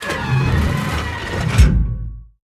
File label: gun reload; World of Tanks